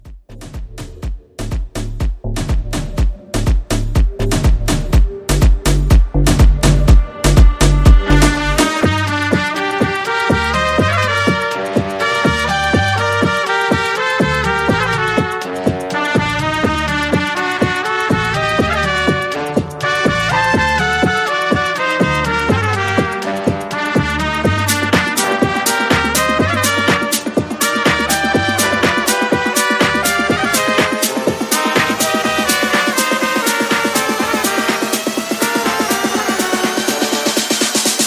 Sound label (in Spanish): Trompeta Rumba Hybrid